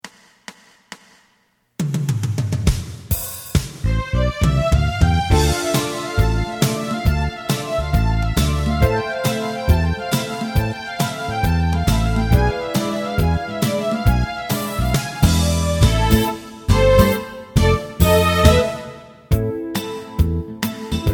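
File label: karaoke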